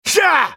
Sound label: effort; Ezreal